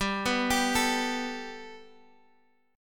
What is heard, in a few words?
G Major Add 9th; Gadd9 arpeggiated